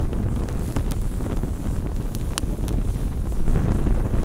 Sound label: Flame